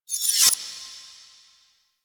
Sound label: Sword Enemy UnFrozen; Weapons; Melee; Warframe; Orokin Weave Sword; Weave Boss